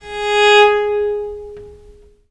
G#3, Historical Instruments, VIOLE MUT; USB Soundscan vol.23; AKAI

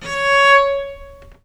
C#4, Historical Instruments, VIOLE MUT; USB Soundscan vol.23; AKAI

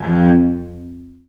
F1, Historical Instruments, VIOLE MUT; USB Soundscan vol.23; AKAI

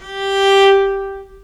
G3, Historical Instruments, VIOLE MUT; USB Soundscan vol.23; AKAI

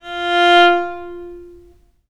F3, Historical Instruments, VIOLE MUT; USB Soundscan vol.23; AKAI

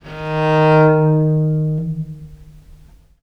D#2, Historical Instruments, VIOLE MUT; USB Soundscan vol.23; AKAI

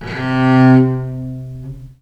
C2, Historical Instruments, VIOLE MUT; USB Soundscan vol.23; AKAI

